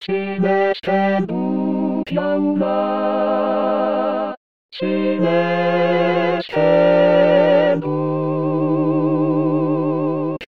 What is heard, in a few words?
Sine dut Choeur